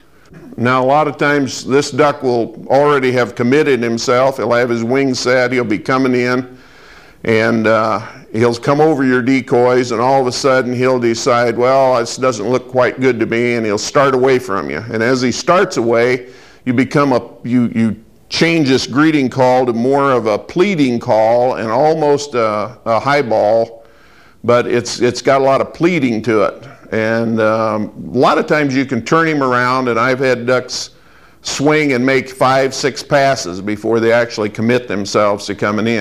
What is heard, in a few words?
pleading, Duck Calls